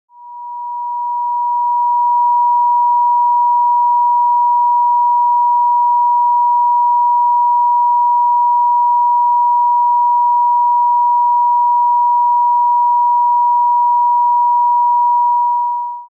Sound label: 963 Hz frequency